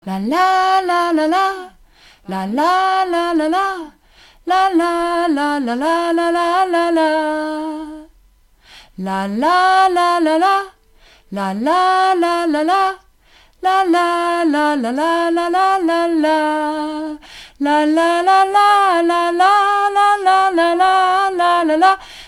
Sopran Refrain La La

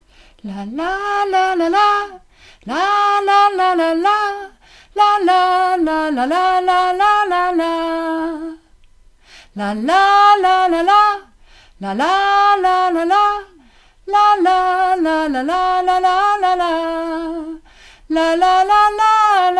Sopran Refrain La La

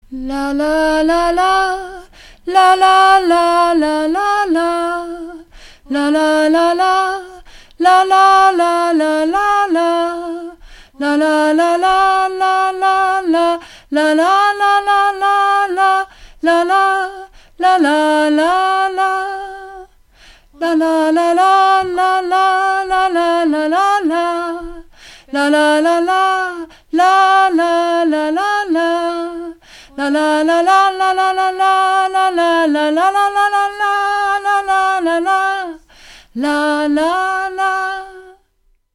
Sopran Strophe LaLa

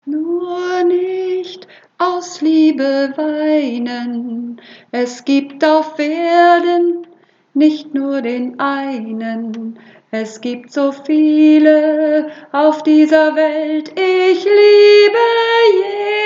Sopran

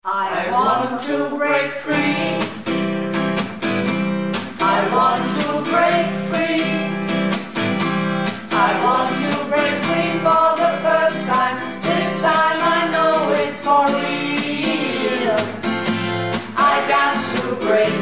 Alle Stimmen

